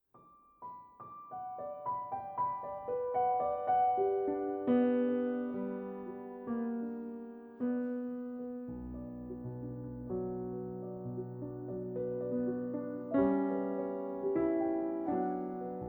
Night Is Mournful for Piano

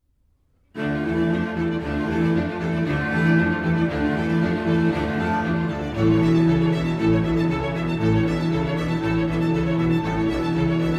Cello Concerto in D Minor, Allegro

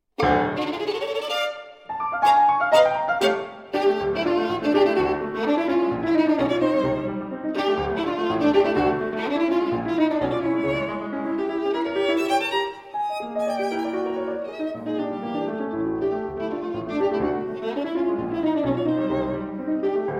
Sonata For Violin and Piano, Allegro Con Fuoco

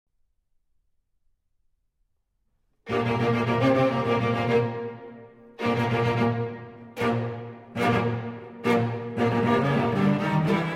String Quartet in B-Flat Allegro

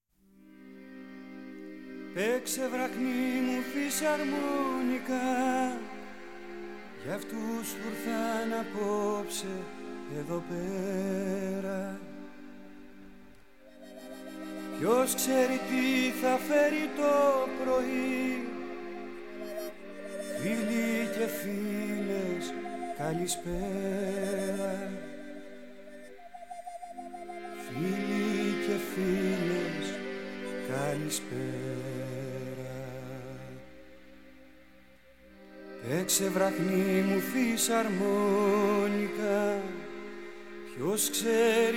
hoarse harmonica

